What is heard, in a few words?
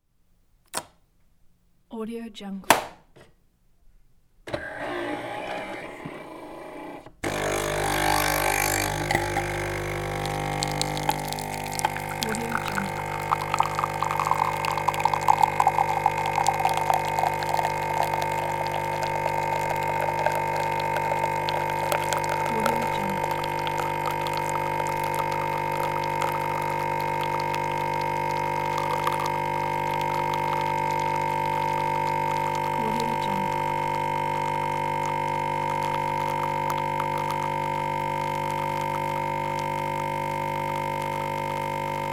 coffee capsule machine; amethistlab fx